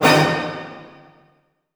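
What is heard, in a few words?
A major fortissimo, orchestra hits; Sound On Sound - Peter Siedleczek's Orchestra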